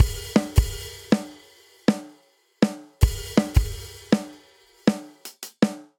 Energetic Pop Breakdown 160 bpm, Drum; 4 4; MIDI Clips; Ableton; Factory Packs; Drum Booth

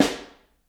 Snare Overhead; Ableton Foundation FreePacks; Snare Designer

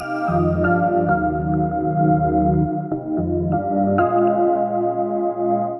Eerie Chords C Minor 165 bpm; Ableton; Factory Packs; Skitter and Step

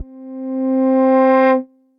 Stringswell, Tension, Synth Pad; Instruments; Ableton; Synth Essentials

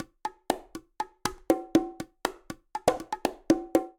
Bongo B; Latin Percussion; Instrument Kits; Ableton; Factory Packs

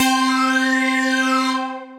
Synth Lead

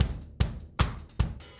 150 Ska Drums; Pop Rock

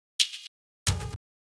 134 Techno Hats; Dance DJ; Drums

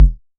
modular quick, Kickbank; Ableton Foundation FreePacks

